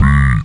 The Beep

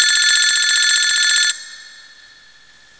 Ring

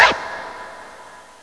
Scratch

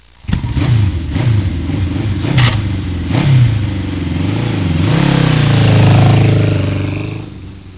Motorcycle Starting

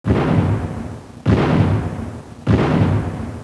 Canon

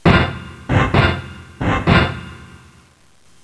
Pump Pump

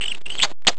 Kisses

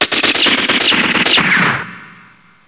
Bullet

